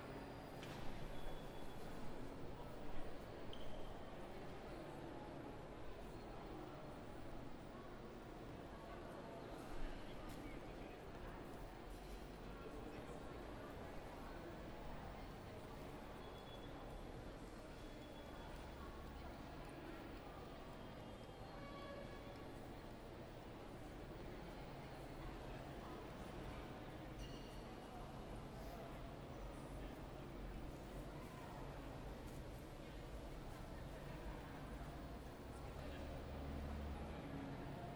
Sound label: ambience; cube-tube